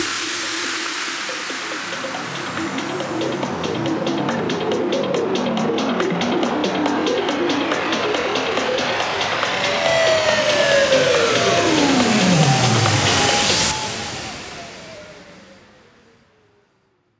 140BPM 8Bars, Hands Up Trance Fills; Insane; Vengeance Samples

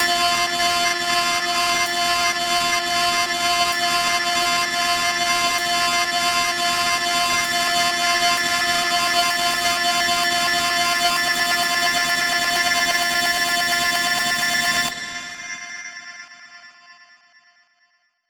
128BPM 8Bars F#, Melody Fills; Shodan; Vengeance Samples

